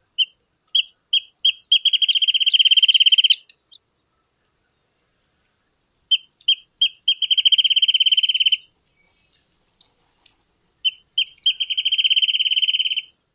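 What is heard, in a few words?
song, Wrentit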